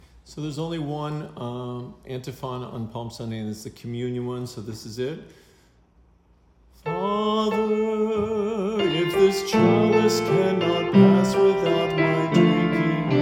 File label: Antiphon